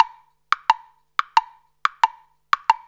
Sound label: Roots Reggae; Sounds Good - Roots Reggae